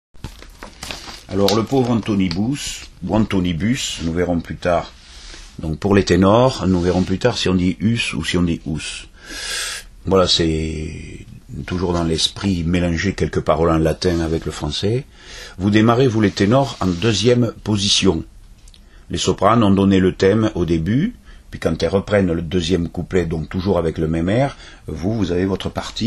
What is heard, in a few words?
tenor; Adagio; antonibus